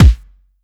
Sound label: Kick, 909 Kicks; Slate; Vintage Drum Machines Volume 3 909 Edition